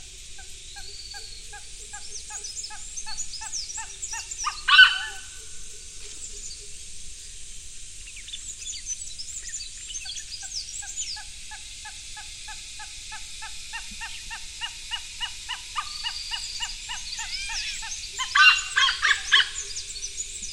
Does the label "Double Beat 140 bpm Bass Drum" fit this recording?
No